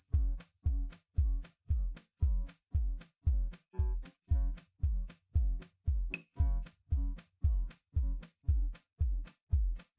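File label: with drums, instantaneous mixture; SiSEC11; underdetermined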